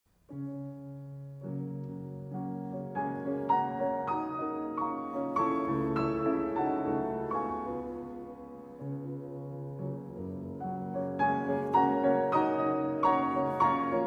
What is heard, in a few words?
Elegie for two pianos; Poulenc